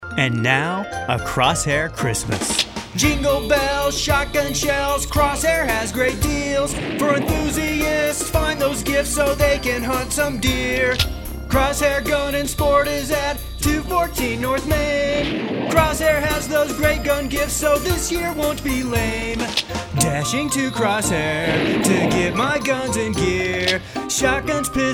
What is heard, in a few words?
Christmas Song; KTQM-FM; Crosshair Gun and Sport